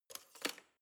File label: knife wood drawer handle slide